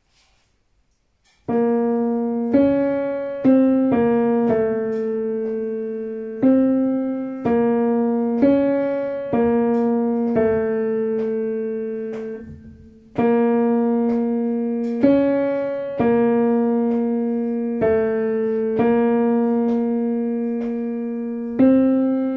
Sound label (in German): Tenor moll